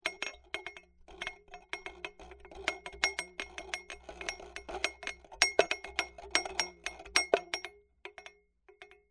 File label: japanese gong